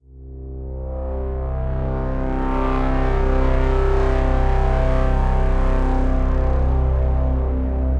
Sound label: Callous Bass, Evolving Bass; Ableton; Factory Packs; Drone Lab